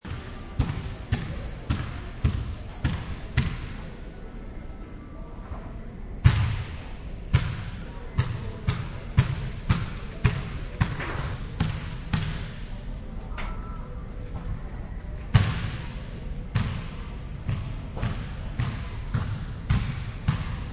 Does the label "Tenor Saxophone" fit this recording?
No